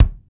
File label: kick, Pop Country; Northstar; Drumscapes Roland